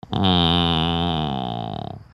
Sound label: mmm; smithers